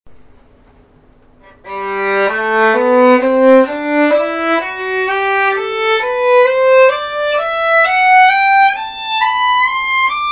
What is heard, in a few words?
Vn ORCHESTRA